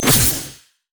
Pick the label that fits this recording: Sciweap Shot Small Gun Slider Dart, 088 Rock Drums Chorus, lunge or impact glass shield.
lunge